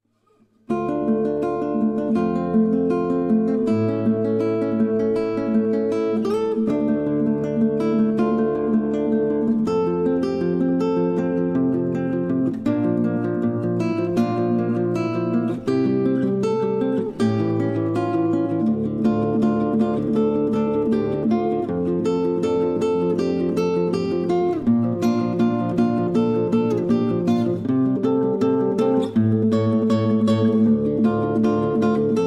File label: Guitar; Gravity Falls